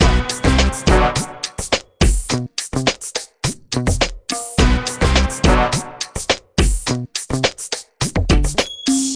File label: Hiphop; Learn to Fly 2